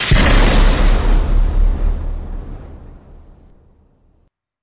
Hit FX Shatter

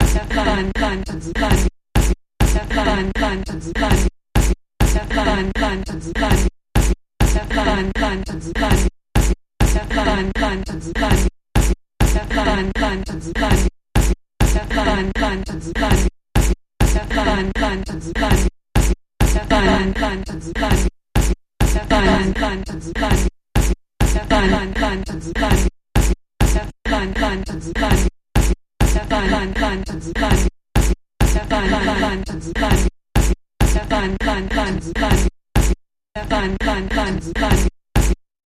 Sampled Voice Percussion Variation Medium Version

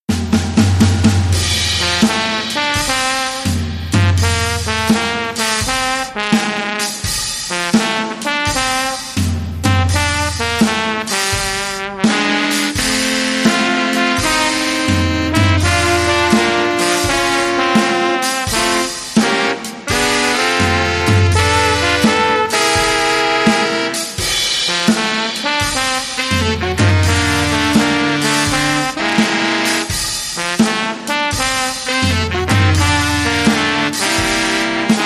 Horns & Drums

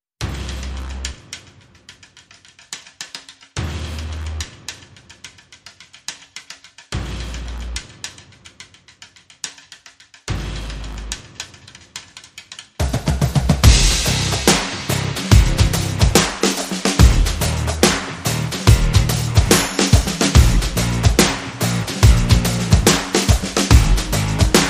Rhythm

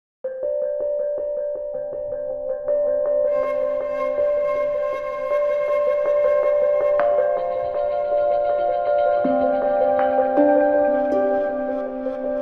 Eastern Forest, No Perc

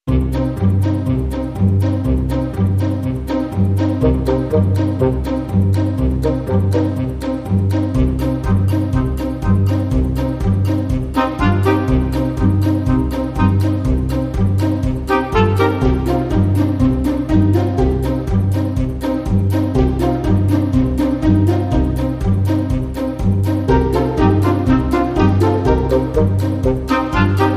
Traditional Percussion Full

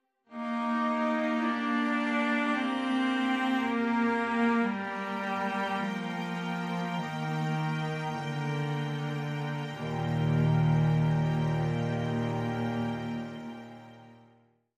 Orchestral Background

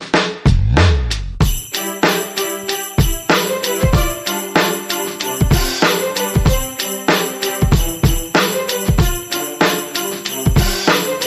Holy HipHop, Rhythm

